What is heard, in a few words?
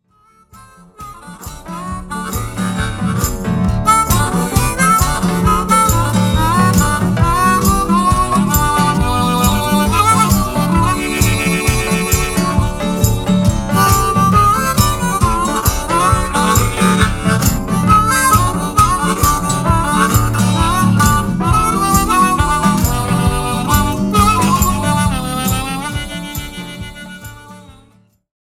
Blues